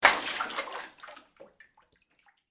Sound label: Water Splash Tub, Hard, Large; Splashes